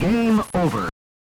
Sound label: SFX Game Over; top gun dual fighters; nes